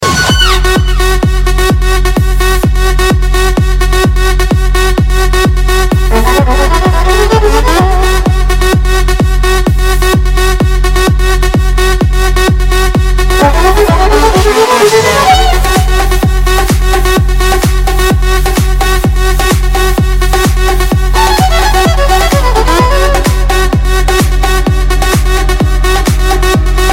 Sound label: Countdown Ringtone